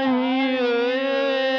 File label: oohyeah loop